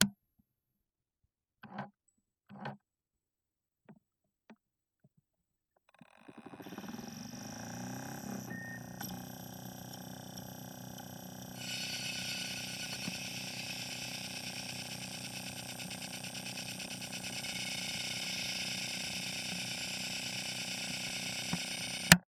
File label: Technology Electronic Portable DVD Player Starting Up